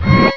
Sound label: mirror; Prince of Persia